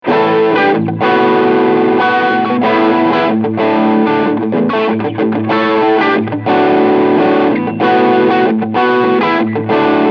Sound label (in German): OD Riff trocken